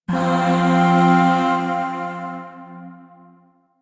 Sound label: Shawzin Chromatic Chord I; Chords; Warframe; Zariman; Void